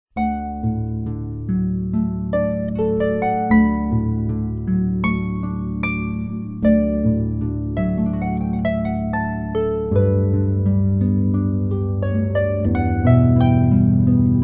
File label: meditation thais